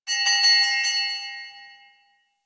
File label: Town Door Bells, Ambience; Warframe; Duviri